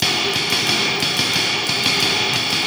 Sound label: Cymbol Particle; Particles